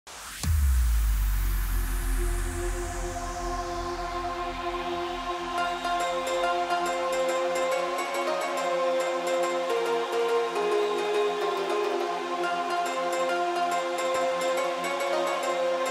Italian Tarantella

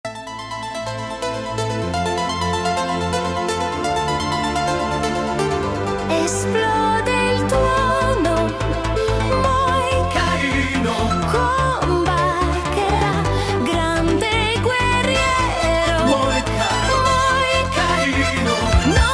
80's disco version; L'ultimo Dei Mohicani

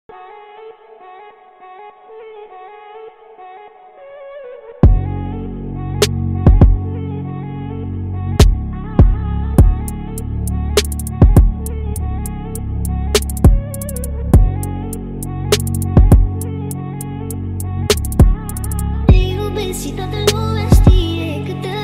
manele Romanian remix car music

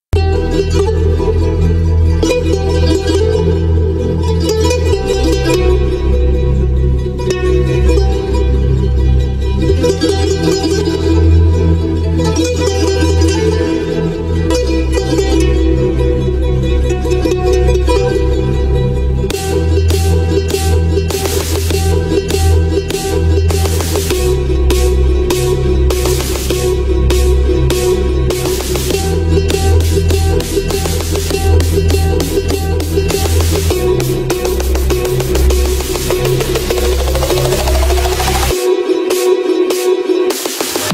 bass boosted; car video

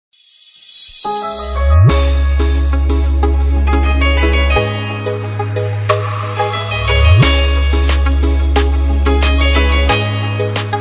piano mix

